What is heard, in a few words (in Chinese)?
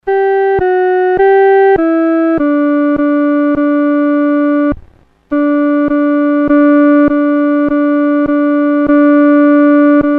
独奏 第二声